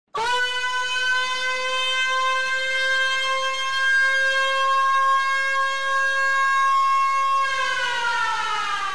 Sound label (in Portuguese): sirene; zap